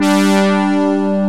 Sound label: BRAZZ G3; E-MU Formula 4000 Series Vol. 2